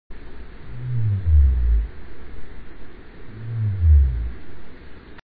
sei whale

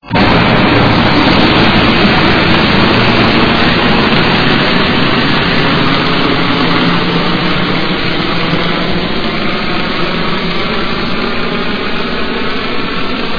spdboat

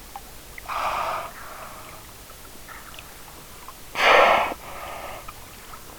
respiration

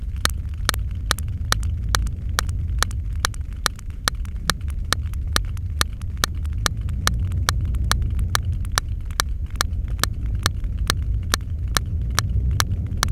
clicks

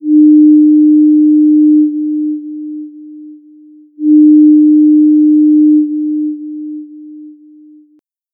foghorn echo; nobska

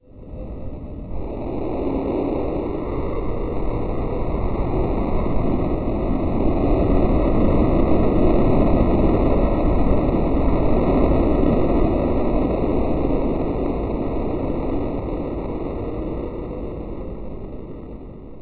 sand

